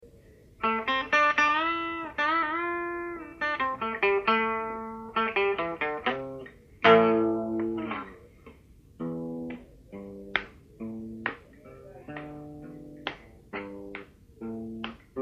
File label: unplugged